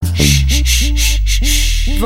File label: Shhh; Housefull